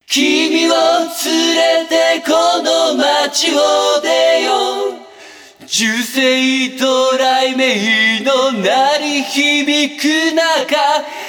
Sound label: Requiem